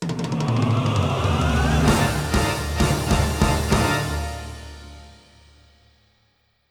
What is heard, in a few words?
Optional Ending; Nightmare Grimm